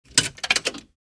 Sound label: Motorcycle keys and ignition locking; vehicle